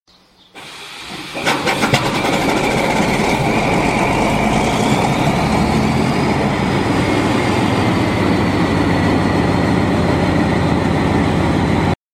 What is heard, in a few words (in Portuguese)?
Motor; Comboios